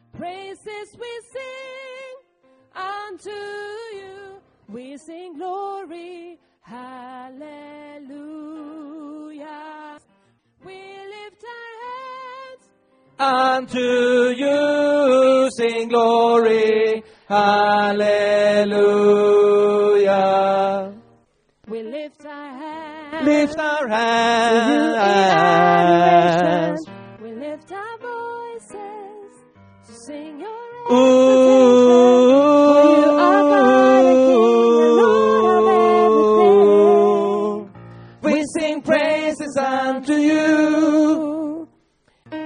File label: tenor